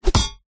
warfare weapon knife throw hit body against wood pin stab hit; zapsplat